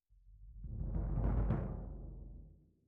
Snare Bot

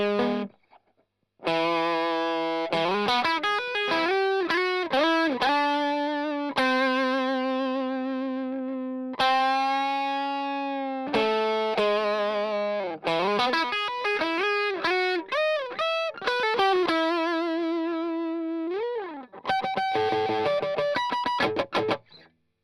Strum Line

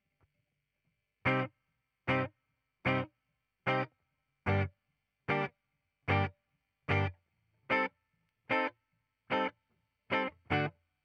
Gtr, Ragtime

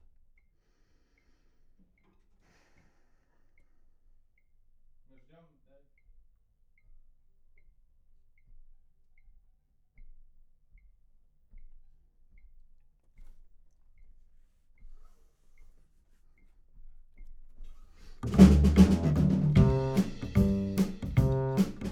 Bass

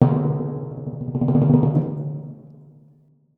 Timpani, Jazz for piano

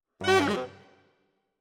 Tenor, RNR for piano

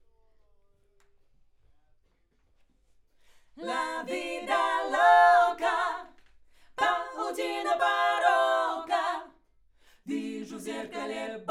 Backs AKG LEFT

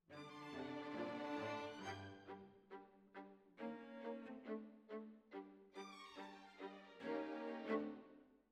Violas Over

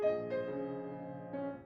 Piano Room

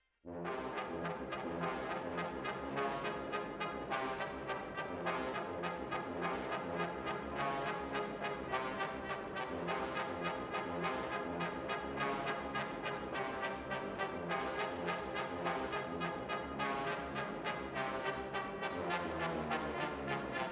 Decca, Jazz for piano

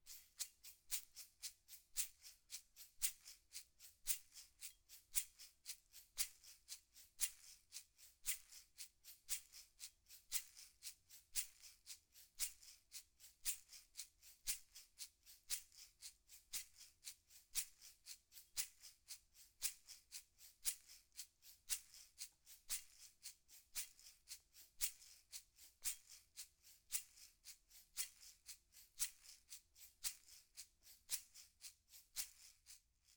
PERC OH M

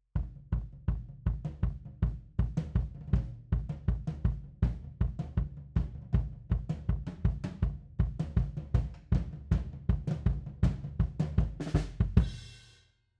Kick Out, 160 BPM

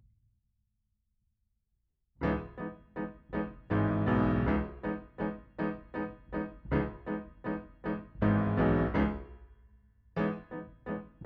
Piano Mono

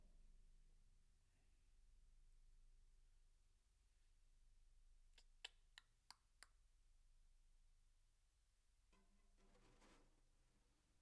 SNR Top

